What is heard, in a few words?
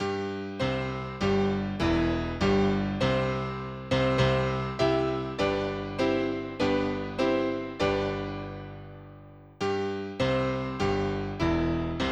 piano